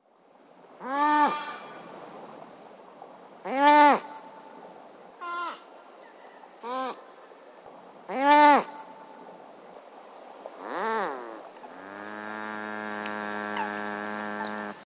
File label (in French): ragondin